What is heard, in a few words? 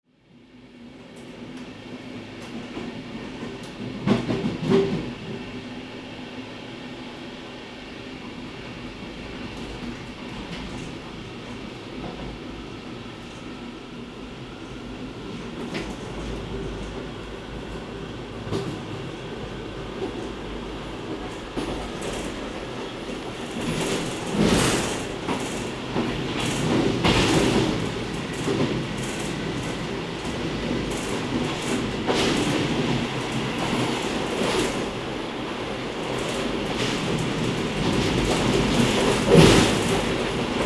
regional train track switches cabin inside